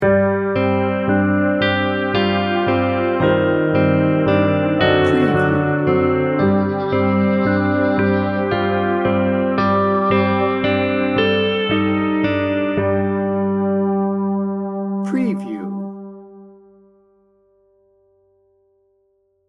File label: distant storm sting bumper